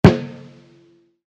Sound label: SNARE; ATTACK SNARE 1; attackpack